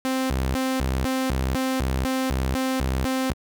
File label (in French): LFO Carrée pitch 12 st rate quarter 120bpm